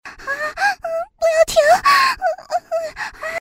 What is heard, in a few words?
moan; Imperial Girls